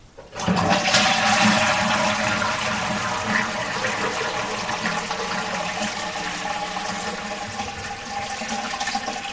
Wet Toilet Flush

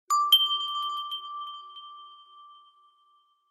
Dingtone

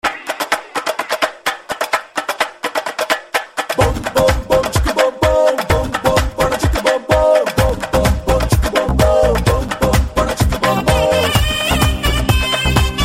Music

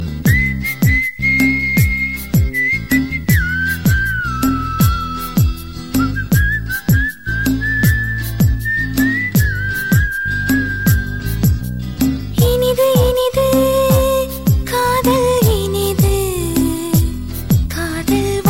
Touchy Whistle Tune

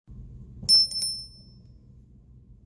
Bell Chime

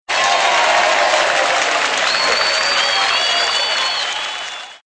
Cheer

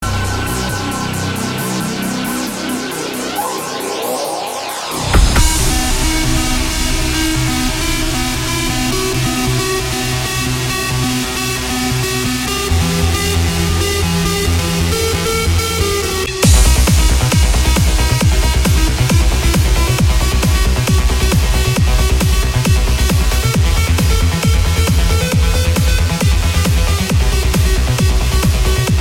Melody-trance

